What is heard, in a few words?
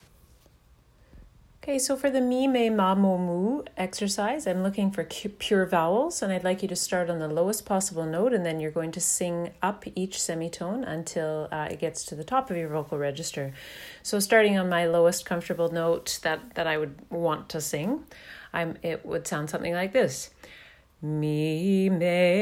Mee May Mah Moh Moo Example